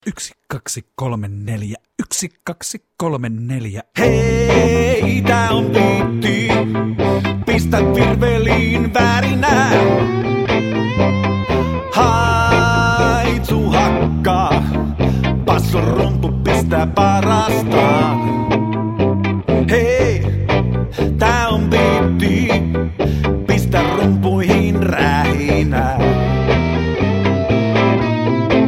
beat ilman rumpuja; alkulasku

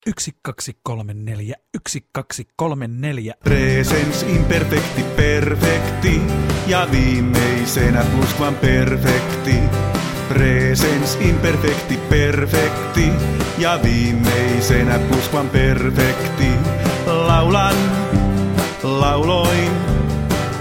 rummuilla; alkulasku; aikamuodot

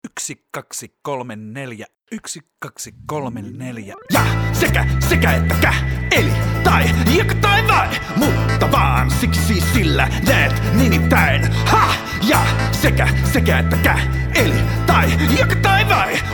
konjuktio rap ilman rumpuja

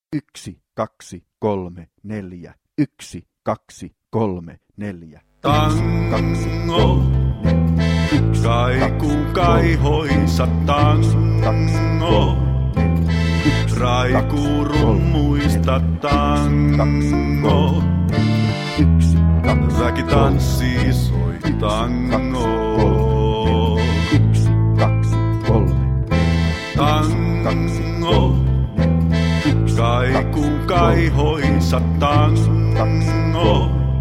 lapilasku laulutango ilman rumpuja

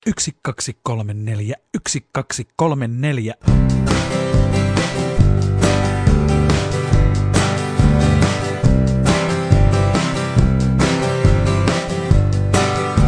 alkulasku aikamuodot ilman laulua

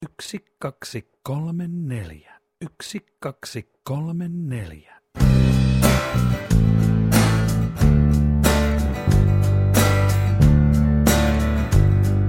alkulasku sijamuodot ilman laulua

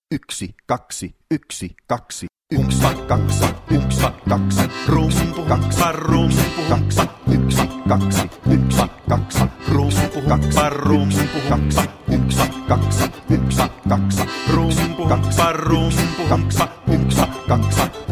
lauluhumppa ilman rumpuja

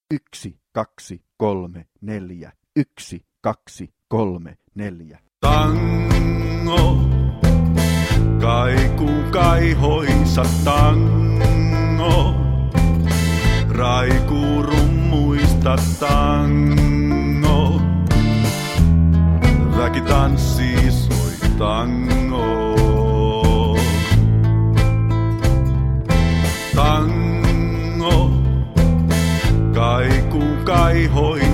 laulutango rummuilla; alkulasku

